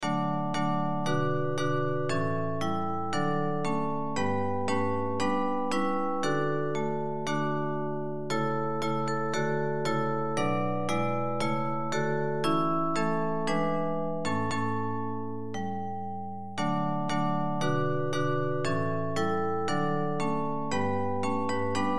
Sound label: Bells; Hymns